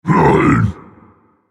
Vocal Colossus Pain Reactions

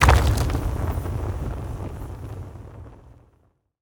Skill1 Impact

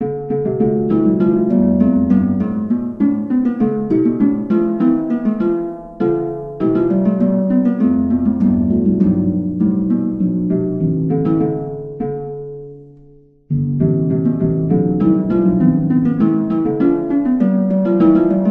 Harp; Zanduvar Carack; The Bard's Tale Remastered Trilogy

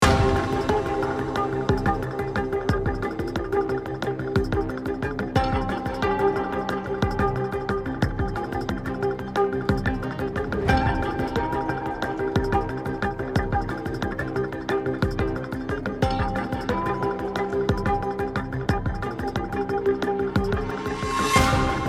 Seaport Warehouse Music

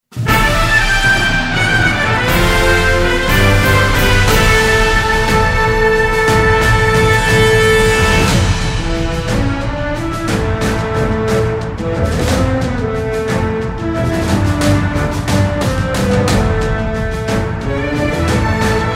Victory Fanfare; Relayer Advanced

